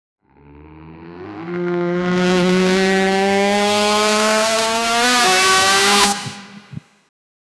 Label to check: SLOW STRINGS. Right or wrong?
Wrong